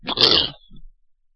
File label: pain; sawer; boss